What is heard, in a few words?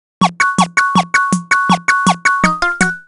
Jingle Medium